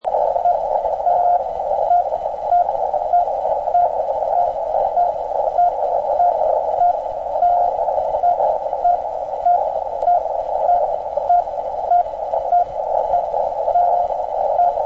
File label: 6940 kHz Link11 CW mode Doppler tone